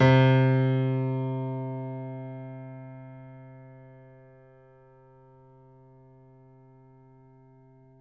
piano; East West; The Ultimate Piano Collection

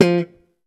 POP F4, Bass Electric

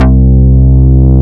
MOOG BS; MINIMOOG; Greytsounds VOL-1

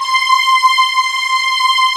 ACSTC STRING, JV 1080; Pro-Rec - Synthsonic

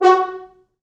4 FrHorns; F#3; East West; Quantum Leap Horns Sax; 4 FrHorns MOD

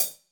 HH, Drums; Bob Clearmountain Drums I

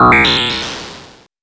POLYMOD; AMG - Vince Clarke Lucky Bastard

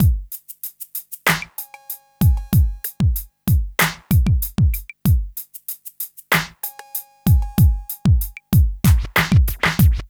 Smooth, R&B Drums

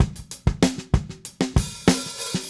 Drums, 70s Analog Funk

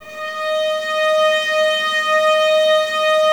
STR VAS D#, Violas, No Vib; Miroslav Vitous

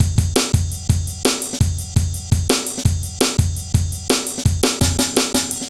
heavy live ride, drum loops

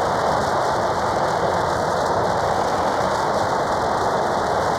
Cracklature, sparse soundscape

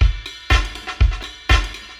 live beat

